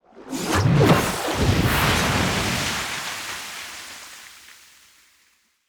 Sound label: water magic